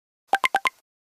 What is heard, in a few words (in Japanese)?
LINE メツセージ